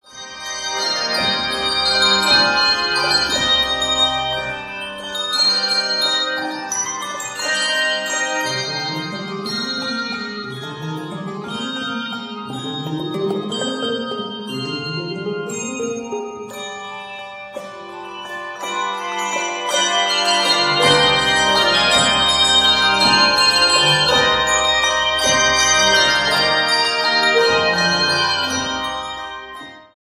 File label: ukranian bell fantasy; Bronze